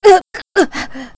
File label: die; zelda; link